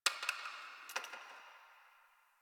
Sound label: Ice Crack One Off; Ambience; Warframe; NineteenNinetyNine